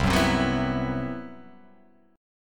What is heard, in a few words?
C Major 7th Flat 5th C